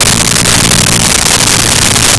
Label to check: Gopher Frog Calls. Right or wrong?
Wrong